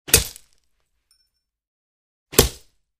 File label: Bow, arrow hits the target; cold weapons